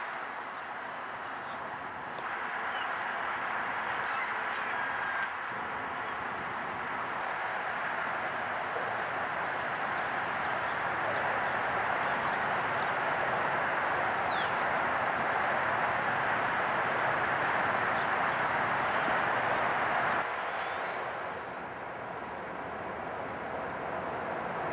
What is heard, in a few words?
Red-Tailed Hawk